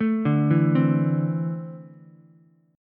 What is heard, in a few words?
E 7th Suspended 4th Sharp 5th, Ukulele; E7sus4#5 arpeggiated; JGuitar